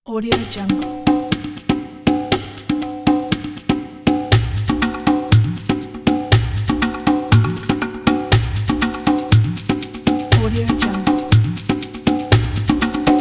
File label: percussion